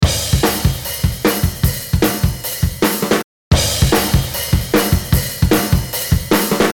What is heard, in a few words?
drums